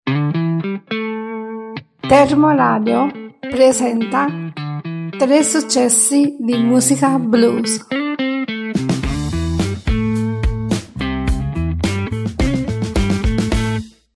blues, jingle; successi

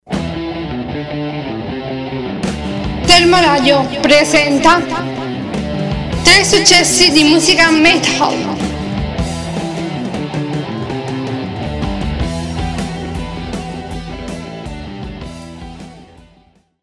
jingle metal; successi

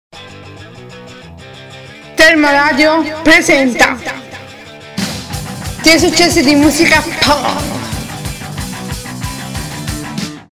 punk, jingle; successi

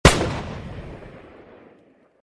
Handgun; suoniFX

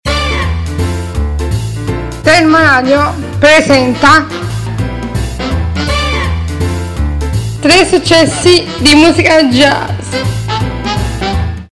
jingle jazz; successi

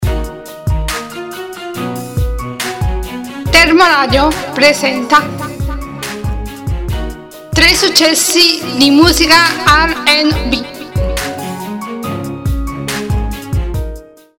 rnb, jingle; successi

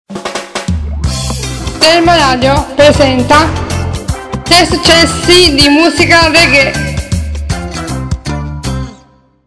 jingle reggae; successi

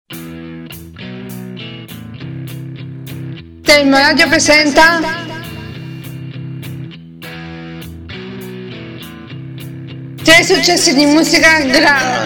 jingle grunge; successi

